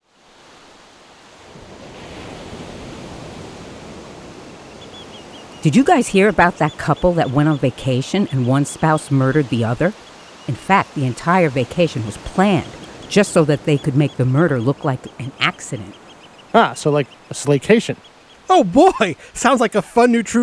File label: Trailer; Slaycation